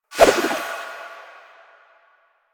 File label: Kunai Whoosh; Warframe; Weapons; Choir